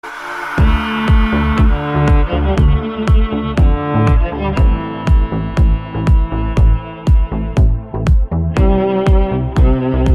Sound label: Ringtone; GOT